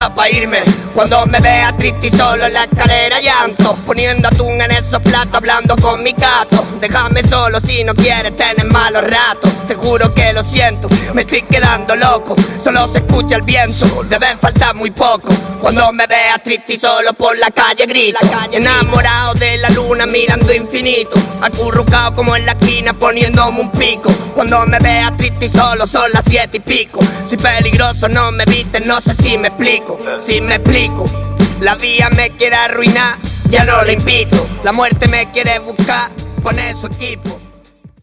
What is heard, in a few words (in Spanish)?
GRITOS; LA CALLE